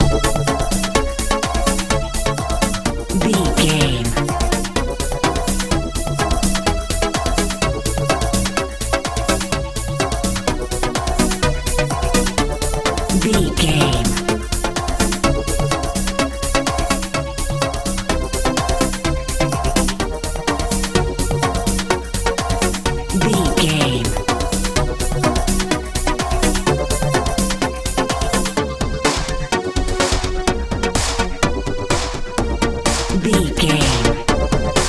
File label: modern dance